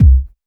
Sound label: kick drum single shot c key; Bitdepth 24